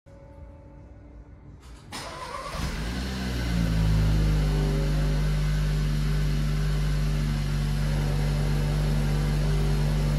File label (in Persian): Tucson, ماشین